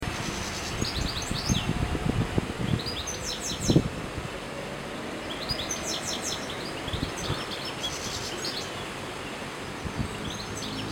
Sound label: Canary Voice